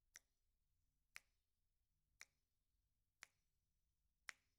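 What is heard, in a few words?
SNR Top, snare top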